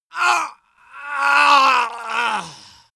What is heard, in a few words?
male death; schreie; menschen; soundpool